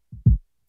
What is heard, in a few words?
KICK